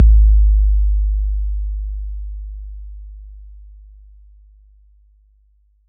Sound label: BD 808 Decay